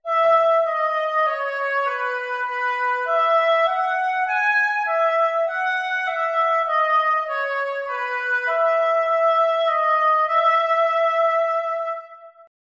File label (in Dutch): vierstemmige zetting